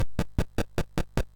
MOS6581R2, GAMES; Sammy Lightfoot

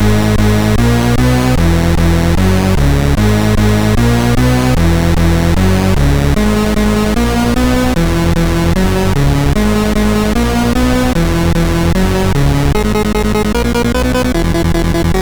Techno, MOS6581R3

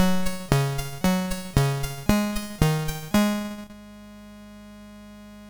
sid MOS6581R2, GAMES; Face Off

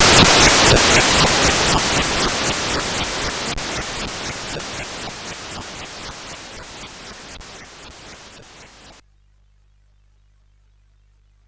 Frantic, CSG8580R5

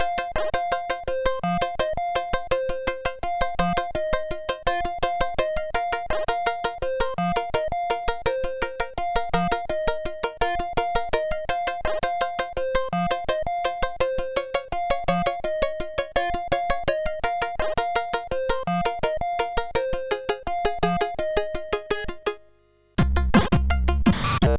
Remix, MOS6581R4; After Earth Remix

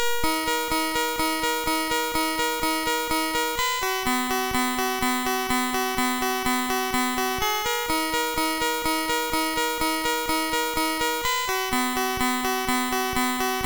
Thunderstorm sid MOS6581R3

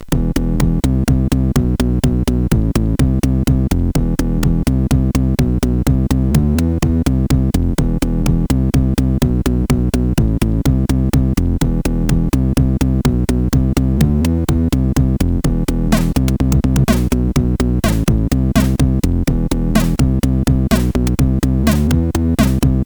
Dnb, CSG8580R5

